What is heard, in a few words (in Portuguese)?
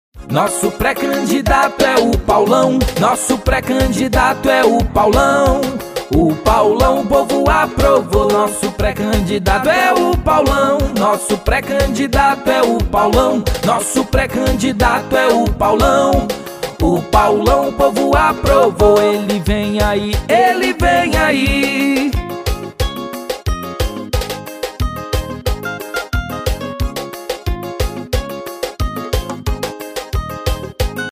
jingle vereador prefeito eleicao campanha politica